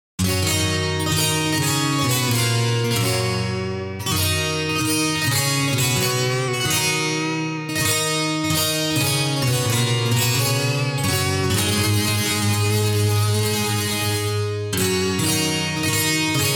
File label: acoustic chords